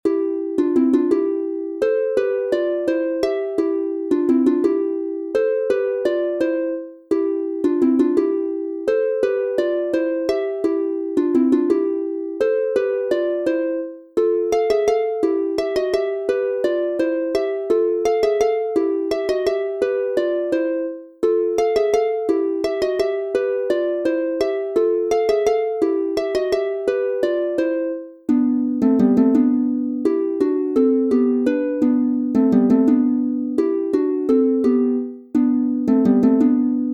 Volksmusik aus Bayern Unser alte Kath C Dur G Dur instr, Harfe

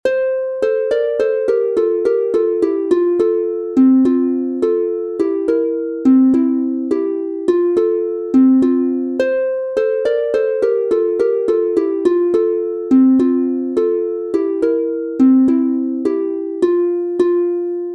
Mango Walk F Dur instr, harfe

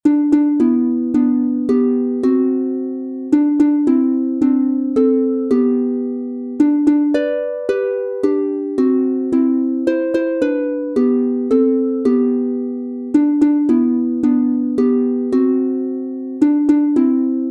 Happy birthday Hoch soll er leben G Dur instr, Harfe

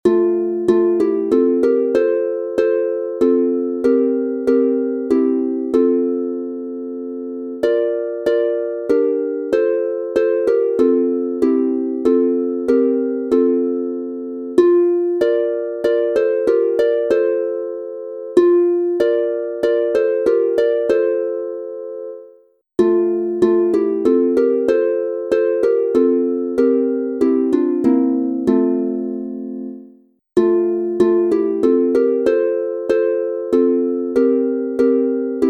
Advents und Weihnachtslieder aus Bayern F Dur 3 st instr, Harfe; Kaum hom die Engl

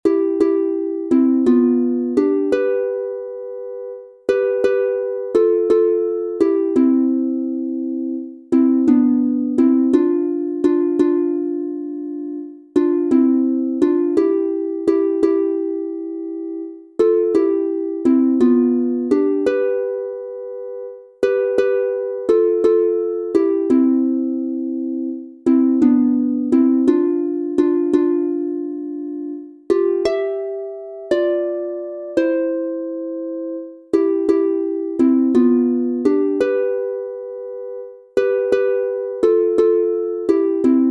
C-Dur 2 st instr, Harfe; Mia san vom Wold daham

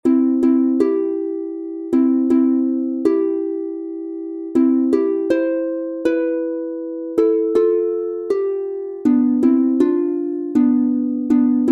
C-Dur 2 st instr, Harfe; Schlaflieder; Guten Abend gut Nacht

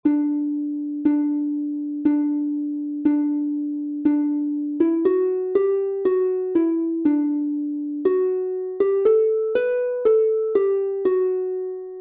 Abendkanon Bim bam D Dur instr, harfe; Schlaflieder

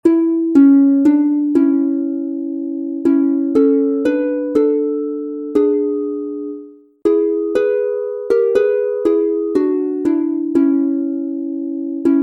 A-Dur 2 st instr, Harfe; Schlaflieder; Still ruht der See

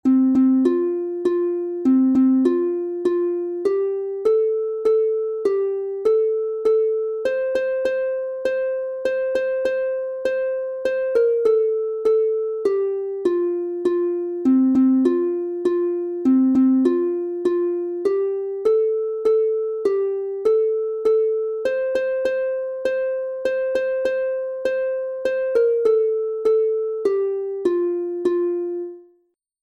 Kanon F-Dur instr, Harfe; Morgenlieder; Guten Morgen

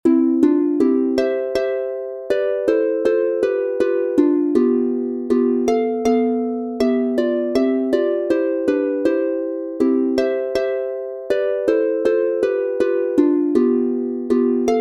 Walzer C Dur; Instr. Stuecke zur Advents u. Weihnachtszeit; Walzer aus Taennesberg

